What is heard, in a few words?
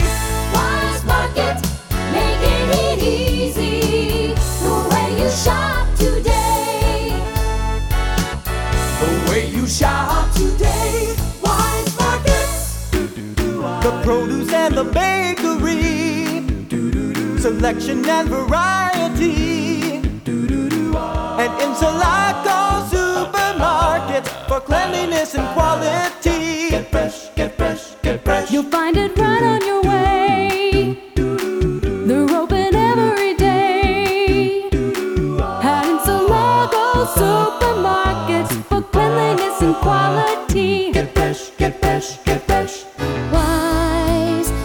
Supermarket Jingles